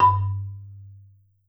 MSOFT G1 -L, Marimba; Sampleheads - Dave Samuels Marimba & Vibes

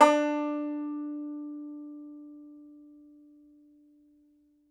Tenor Banjo, Plucked Strings; E-MU Producer Series Vol. 7 – Old World Instruments

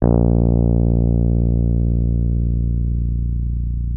A#0, Rhodes; Xsample VOL-1

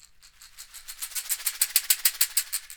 CAXIXI; ILIO; Synclavier Percussion 2; World & Orchestral

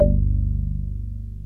PERC 5ths

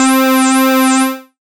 Dance Synths, Acid Bubble, c

